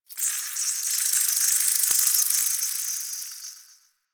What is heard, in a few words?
Insect Buzz; Warframe; Ambience; Eidolon Plains; One Offs